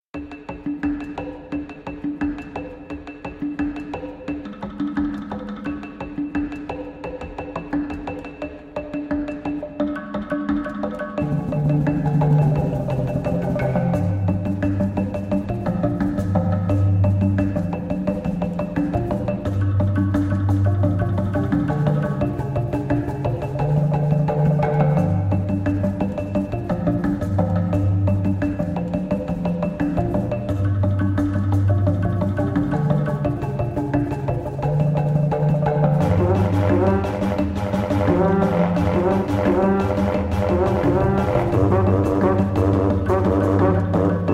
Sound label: Tribal Battle